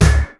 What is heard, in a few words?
BASS KIK; BEAT2000